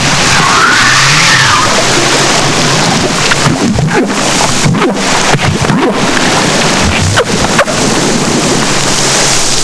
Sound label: M Novaeangliae